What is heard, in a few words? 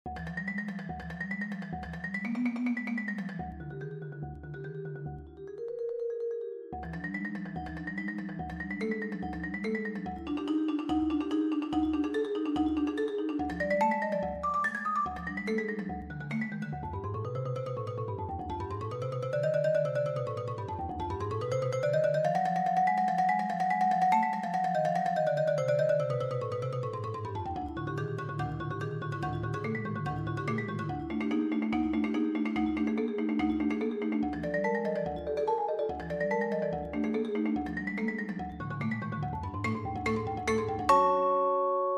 piano suite arranged for vibraphone and marimba; bartok suite